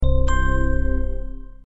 Cell Phone; nhac chuong; nhac tin nhan

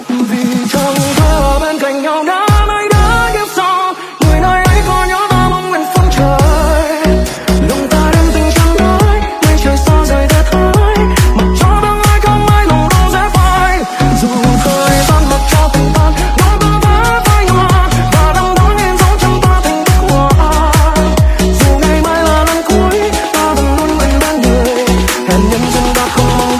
remix

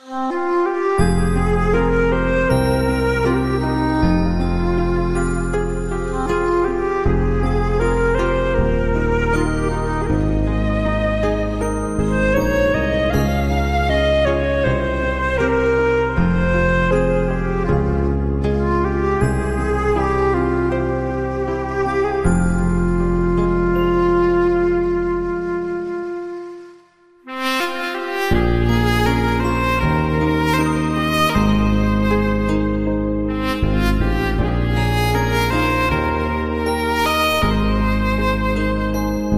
Piano

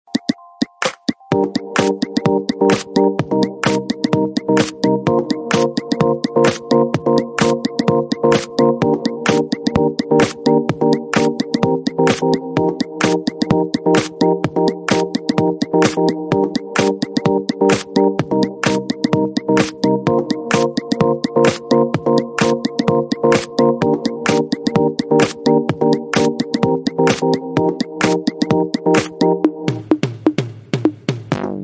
Nokia Lumia; nhac chuong

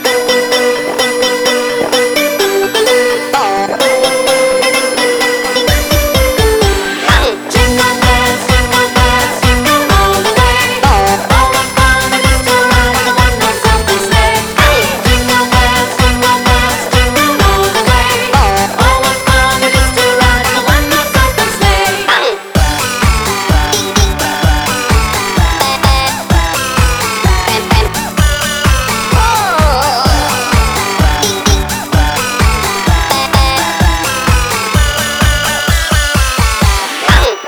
Jingle Bells, Nhac Chuong; nhac quoc te

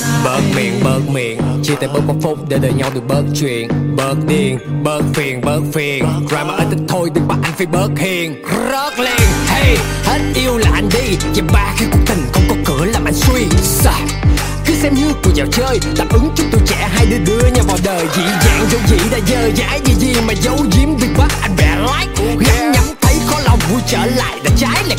Rap

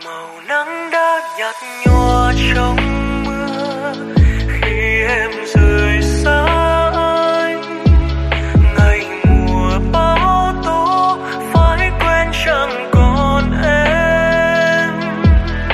Lofi; Nhac Chuong

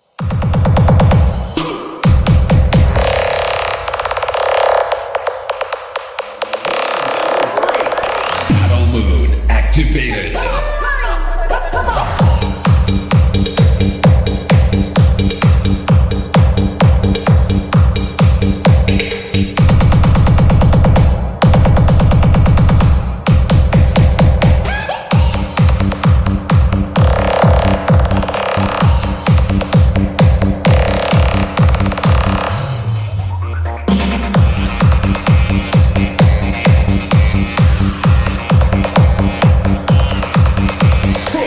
Remix

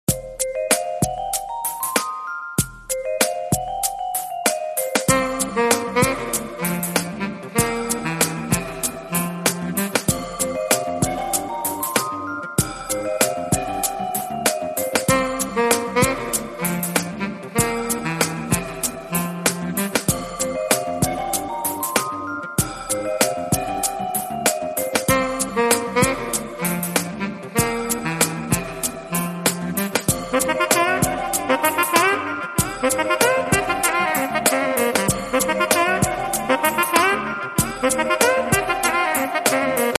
sacxophone; iphone; nhac chuong

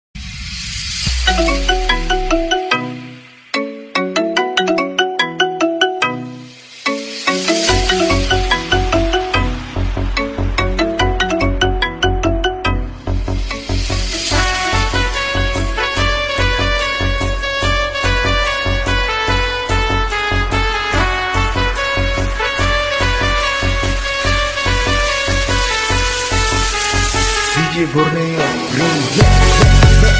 Trumpet Horror Ringtone Remix; Iphone; Xiaomi

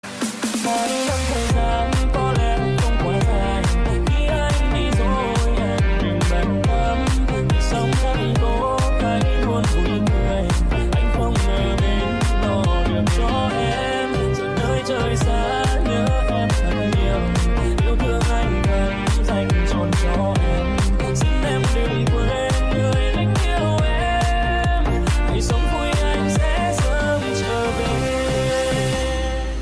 remix; nhac chuong

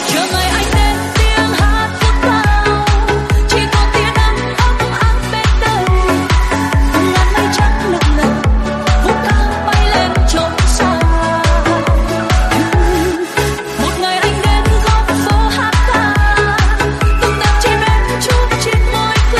Remix; nhac chuong

